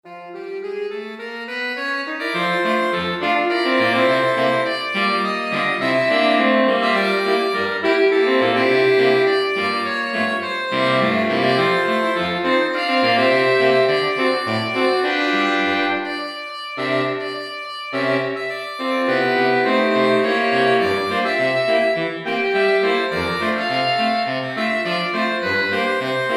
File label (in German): saxophonquintett